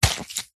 foley wet cloth dab squelch soft single; zapsplat

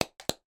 ice chunk light impact chisel; sound effects one

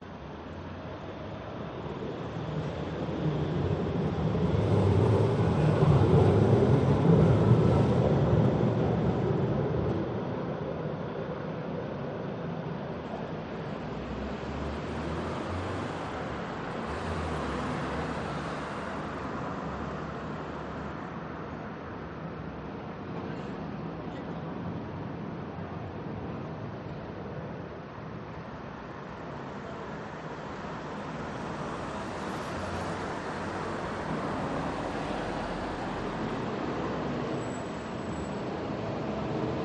BALCONY Daytime Medium Traffic Tram Passbys

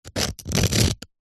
foley rock or stone single scrape cut score knife; zapsplat

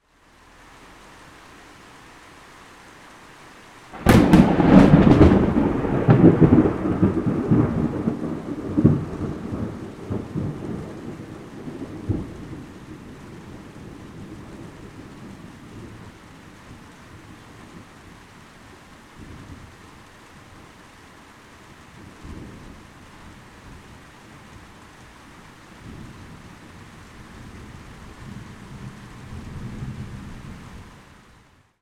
nature weather thunder overhead light rain

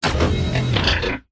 science fiction robot large mechanical movement

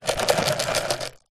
plastic pot containing small debris shake; foley

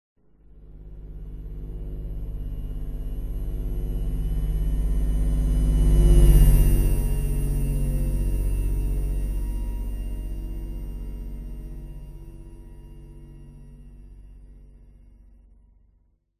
science fiction spacecraft slow fly by; Audio Mangler

